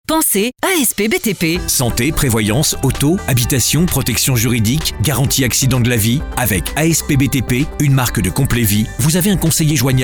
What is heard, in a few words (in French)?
MUSIQUE